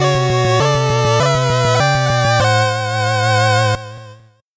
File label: 8bit jingle fast